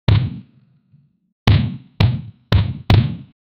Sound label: basketball dunk